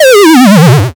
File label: powerdown